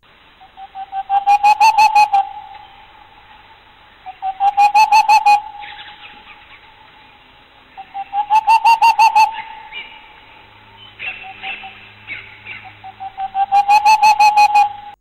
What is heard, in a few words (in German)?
rauhfusskauz